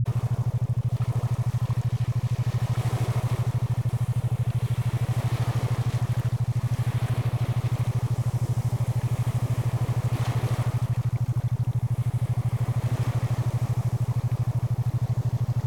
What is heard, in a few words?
Sleep Induction with Ocean Waves